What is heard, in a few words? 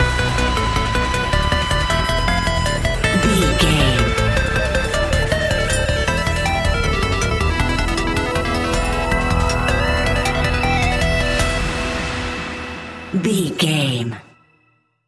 epic video game adventure bumper